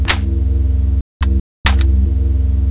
sg552 reload, weapons

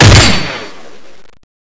m32 venom, weapons

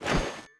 axe idle, weapons

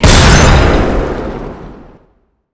halogun exp

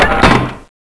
attack leg start; CSO Cronowing; cronobotics54

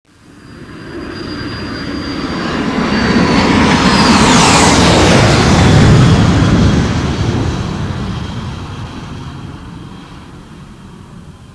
bunkerbuster fly

